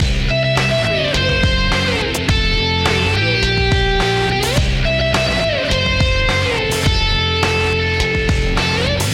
wind grunge loop

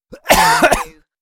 Male Cough; beanstalk audio

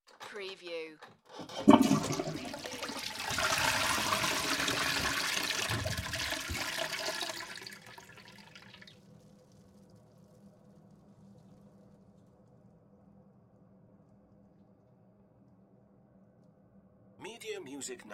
Toilet Flush; beanstalk audio

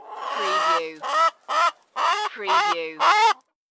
Chickens Squawk

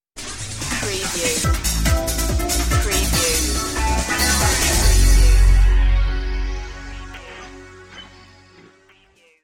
lazer parade stinger

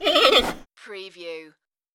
creature talk

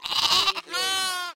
Lamb bleating; beanstalk audio

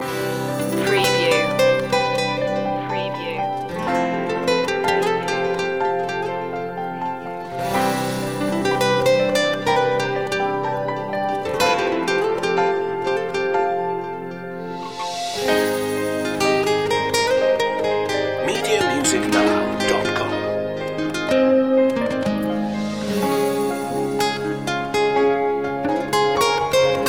so quiet loop

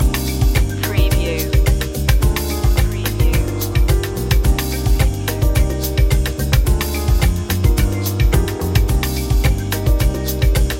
summer lounge loop

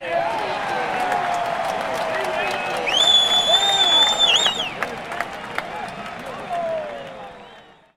Sports Arena Baseball Crowd Cheer Clap Whistle

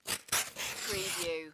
paper cut

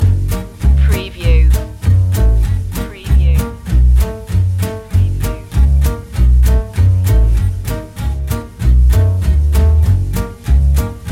feel good swing loop

